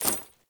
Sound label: Generic Footstep; Warframe; Uriel